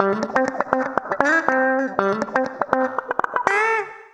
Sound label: Acoustic Guitar Loops, 120; USB Soundscan vol.04 - Electric & Acoustic Guitar Loops; AKAI